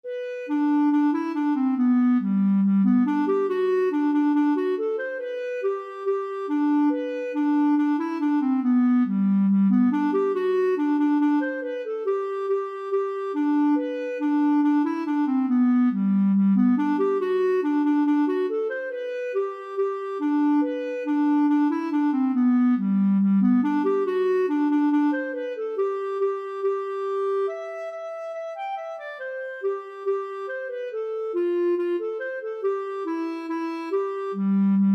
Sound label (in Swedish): Schottis; efter Artur